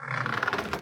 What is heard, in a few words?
cata pull; egypt stone war online